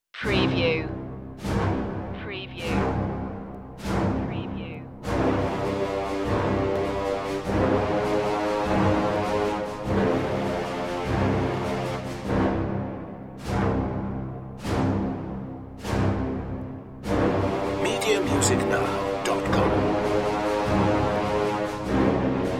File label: dark opening less brass